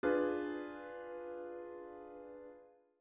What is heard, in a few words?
Ddim7